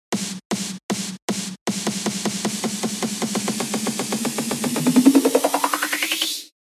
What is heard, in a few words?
hardstyle snare roll; EDM; スネアの刻みを徐々に細かくしていく手法です。; ハードスタイル等では、刻みが細かくなるにつれ、音の高さも徐々に上げることが多いです。; 例：4分⇒8分⇒8分3連⇒16分; この記事では、EDM（ダンスミュージック）の4つ打ちドラムの打ち込みを解説しますね